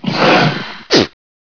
gator see player; gearbox

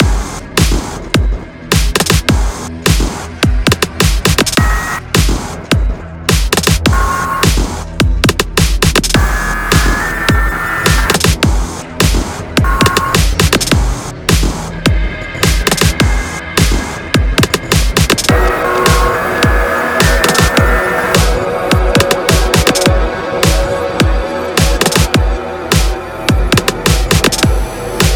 fight loop, music; svencoop; morass beta

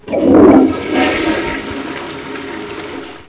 flush

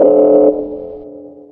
combine bank alarm loop

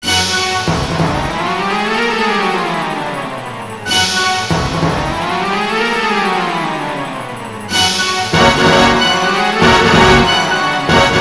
crisis intro, music

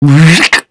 knife; kill streak

